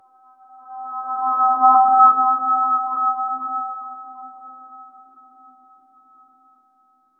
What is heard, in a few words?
chase tension surreal; motion blur